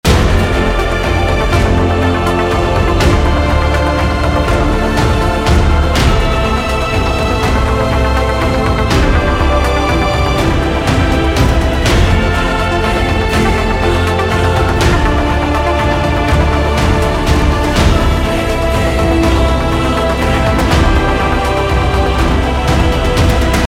Loop Action; Volume macos ps vita ps4 windows gamerip 2015

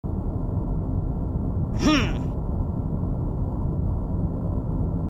Power Glove; Infiltrating The Airship

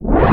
Go Panel

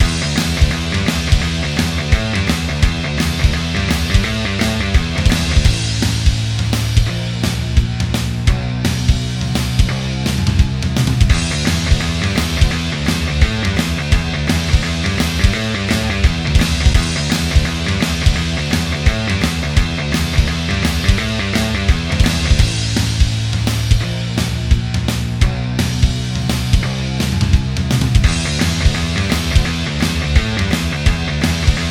3d Music

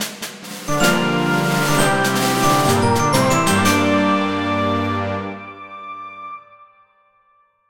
fanfare; healers quest; android ios mobile gamerip

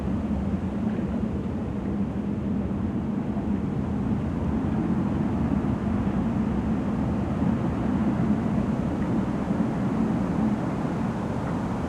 Ice Caves, Cave Water Rumble; Level3

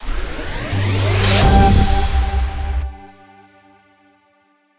Transition; Everspace 2

